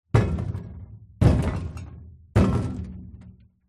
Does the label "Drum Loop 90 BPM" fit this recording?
No